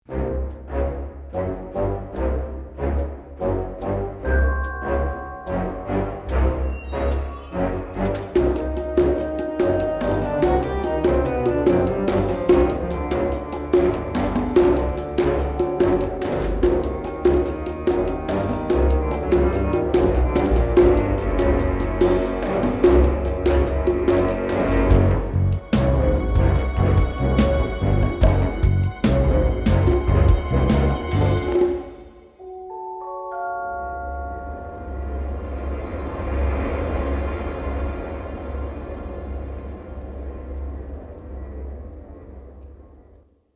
Low Winds; CineWinds Monster; Double Agent; Ben Chrisman; Cinesamples; Kontakt

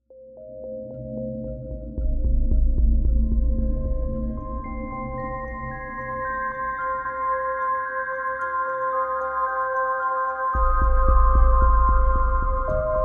Rumba Boxes; Soundiron

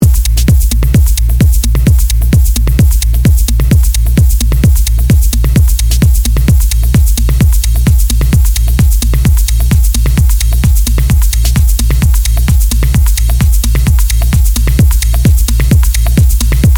techno

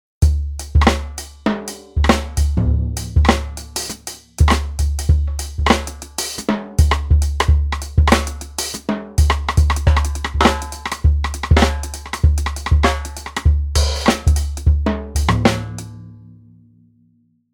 Hiphop Jazz

